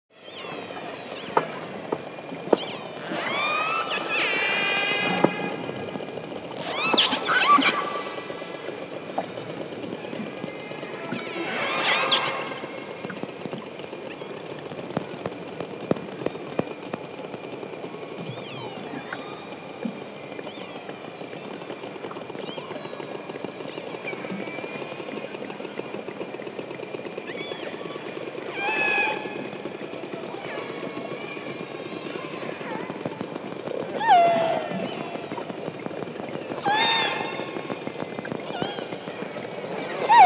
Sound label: Whale Killer Clicks